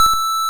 Guitar E3; multi-samples; CHIPSHOP DELUXE

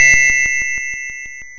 YM Chord B; ChipShop Deluxe; ChipShop Hits

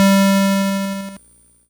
GB Synth G; SYNTHS; CHIPSHOP DELUXE; CHIPSHOP HITS

